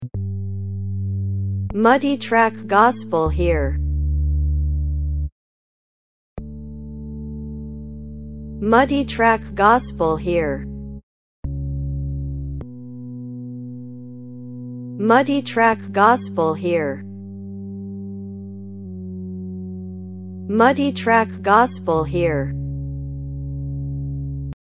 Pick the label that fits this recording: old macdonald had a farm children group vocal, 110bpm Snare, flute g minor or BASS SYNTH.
BASS SYNTH